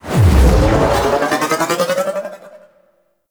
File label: Rail Jack Ship Boost A; Warframe; Vehicles